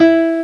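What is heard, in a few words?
Guitar (nylon) (E3); X-Wing; X-Wing Patches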